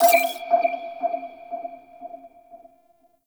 Link Bleep; Squad Link; Sentient Op

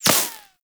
Laser Impact Default; Warframe; Bullet Impacts

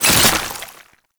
Wrist Gun Alt Shot React; Warframe; Tenno; Incarnon

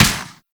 Pursuit Space Ship Turret Fire; Warframe; Grineer; Turret B

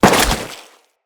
Crewman Step Goo, Footsteps; Enemies; Corpus

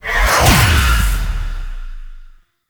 Prayer Circle Start; Warframe; Tau; Roathe

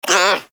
Armadillo Vocal Pain

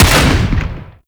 Sniper Rifle Shot; Warframe; Grineer; Gorg

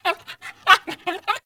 Puppy Idle Speak Playful; Vocals; Warframe; Kubrow

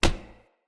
Unarmed Punch Impact Metal; Weapon Impacts; Player; Environment

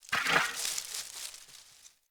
Fawn Idle Chatter; Encounters; Animal Rescue

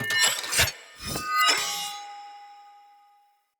Cleaver Finisher Stealth Accent, Swords; Weapons; Player; Bromius Cleaver

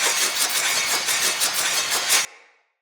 Saw Dagger Recall Loop; Weapons; Player; Swords

